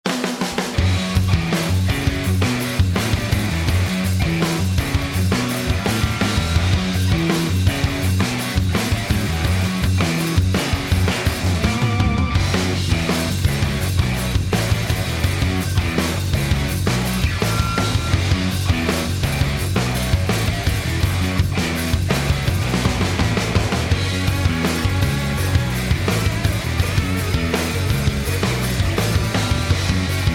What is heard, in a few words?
Gritty Part 3 Extended Instrumental; PM USA